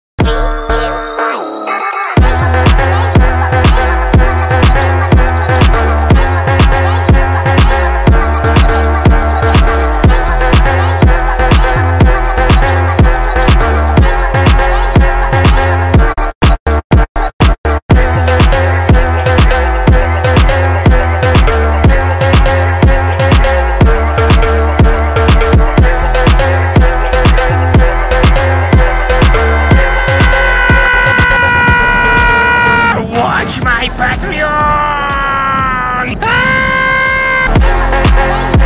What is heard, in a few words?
phonk